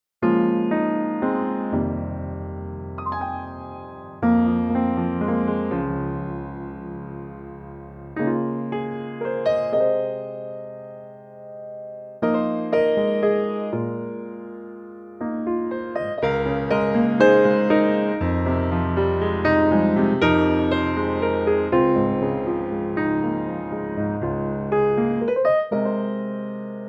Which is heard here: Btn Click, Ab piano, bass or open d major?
Ab piano